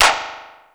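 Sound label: delay clap, plastic drum; 90 sSampleCDs; Crypton - DrumBase5000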